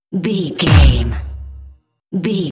dramatic hit deep electronic wood

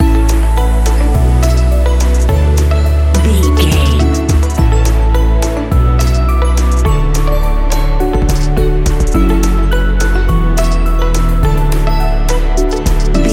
uplifting rising

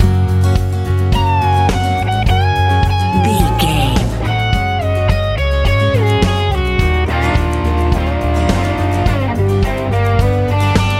happy back champ love easy pop

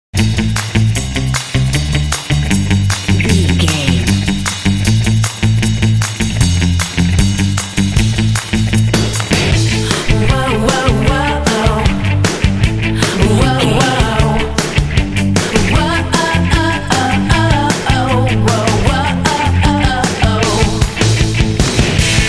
punk chicks rock

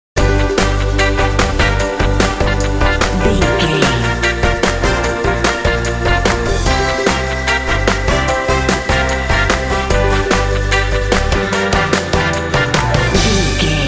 sassy hipster girl sting

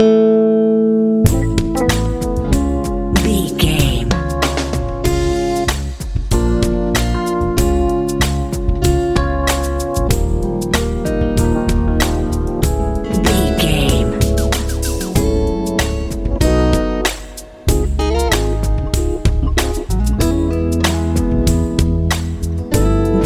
modern rnb

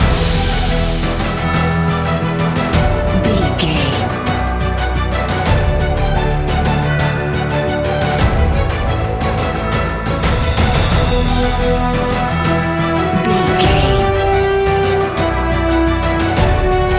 epic cinematic loop

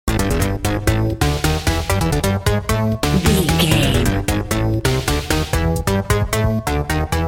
intense video game level loop

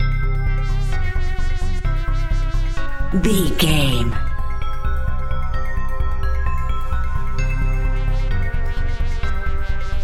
80s synth sci fi

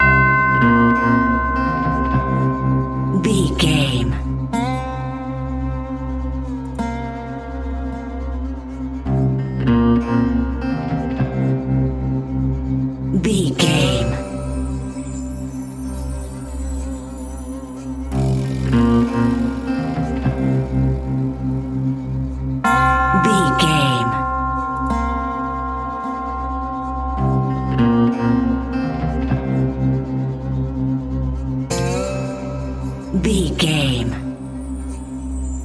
tumbleweed western 106 bpm loop

